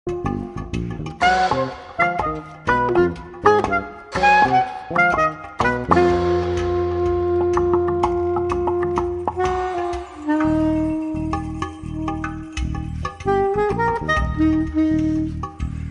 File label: Jazz